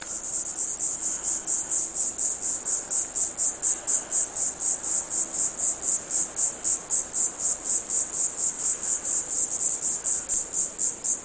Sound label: D viridifascia calling; United States